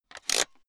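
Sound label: ratchet rattle low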